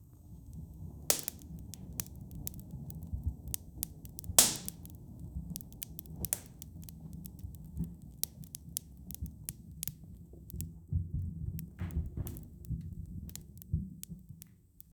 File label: soft crackling of the sto, domestic